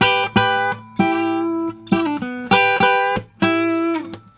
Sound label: Blues Guitar lick; ILIO; Fretworks; 96 HIRUM LICK